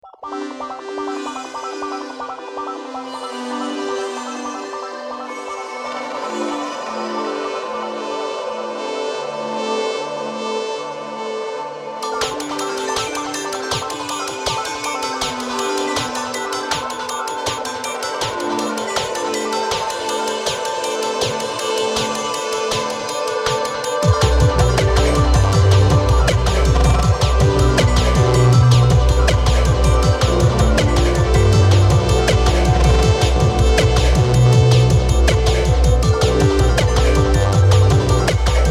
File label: glitch pad; Glitch Pad beta 0.9.1; demo song; 11 instances; NUSofting; audio plugins